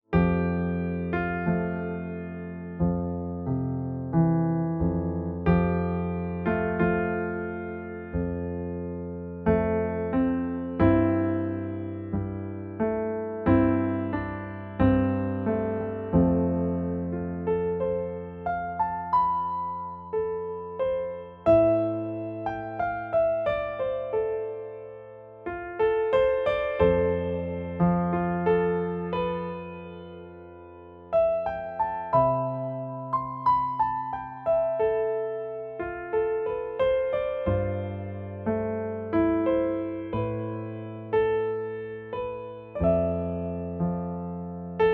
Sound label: Piano Solo